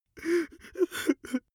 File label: male whimper; menschen; koerpergeraeusche